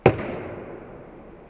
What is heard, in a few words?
Trash Can